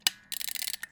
Close Rewind Of Metronome And A Music Box; The Doll Hit; McDroid

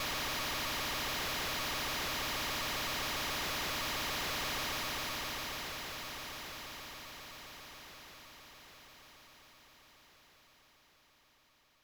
Waterfall; virtual boy wario land gamerip

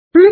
Jump sound; Wario Gets Straight

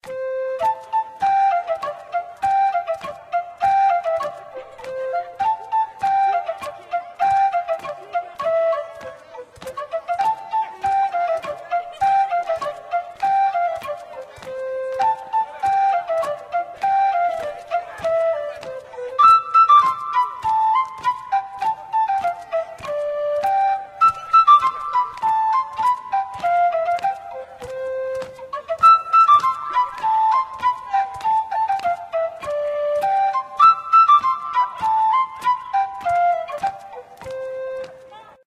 flute dance; Tempest Pirate Action RPG

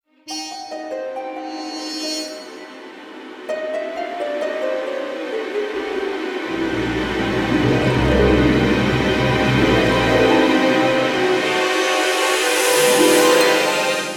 Music Time Hole; Costume Quest 2

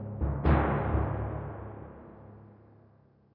Tymp

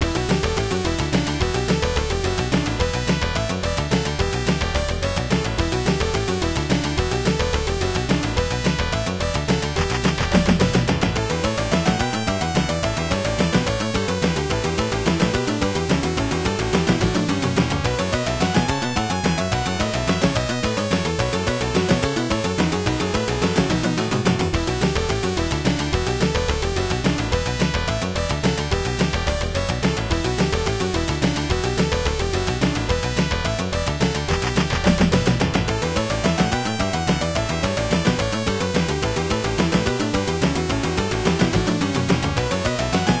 3D Battle; Aurail; System 16B; arcade

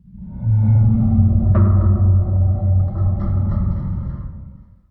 Ambient

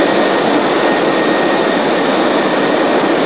Jetpack Loop; Jetpack Divers; Rabbids Big Bang

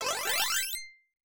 Round Clear SFX ~ Lottery Win Bonus; Blue's Journey; arcade

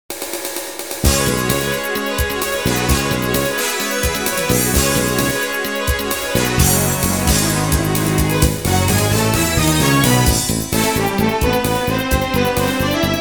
refrain (Instrumental)

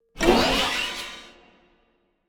Tap; Yu-Gi-Oh! Master Duel

